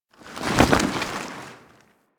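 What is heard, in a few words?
Settlement Cloth Random Ambience; Warframe; Grineer Settlement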